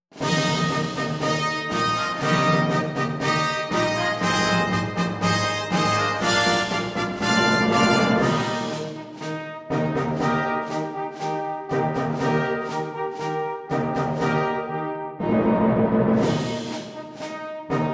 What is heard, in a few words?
Eb Concert Fanfare, brass